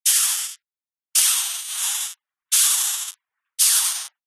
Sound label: insect rhinoceros beetle hiss x4; animals